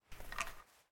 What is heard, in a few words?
Take Catridge; Game rip; MiSide